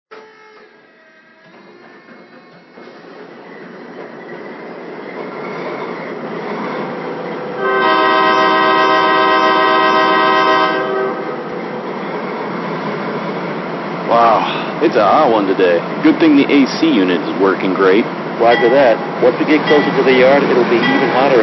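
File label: Dash-8 3 chime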